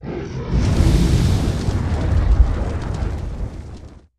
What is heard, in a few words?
Dragon Roars Breaths